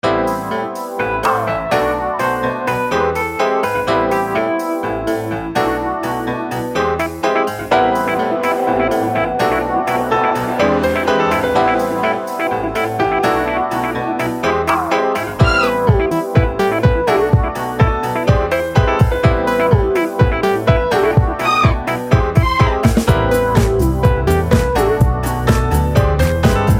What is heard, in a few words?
electro goodies happy hour